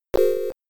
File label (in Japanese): ダメージ音; Baraduke Alien Sector; Arcade